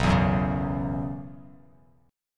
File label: B Suspended 2nd Suspended 4th; strummed; JGuitar